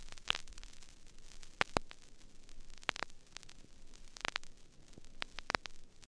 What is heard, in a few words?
Vinyl Noises